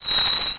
Vehicle Minecart Brake; Playable Tutorial Level; TR3

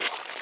Footsteps Ice; Lara; Playable Tutorial Level

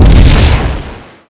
Dragon Leg Hit; Playable Tutorial Level; TR2

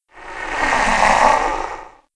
Skeleton Ghost Appear; Playable Tutorial Level

